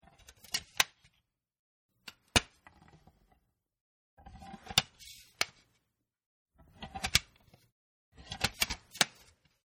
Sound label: CD Slim Case Close, Cd Dvd Slim Jewel Case Close; 5 Takes; Snaps (Sound Effects:Objects)